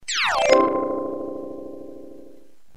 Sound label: Sms; ICQ